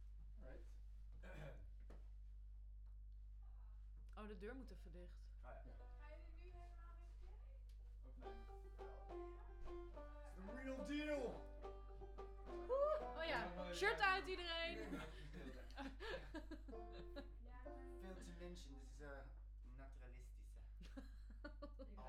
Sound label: Voc Omni